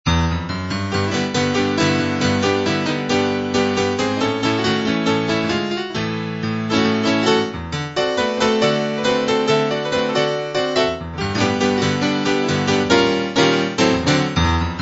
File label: midi guitar major